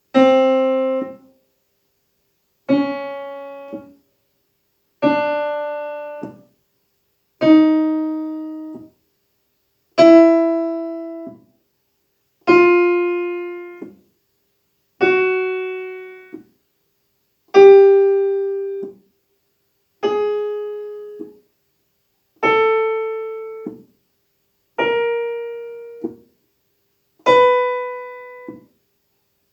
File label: piano